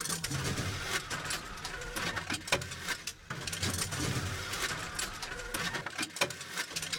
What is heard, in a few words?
gun reload; World of Tanks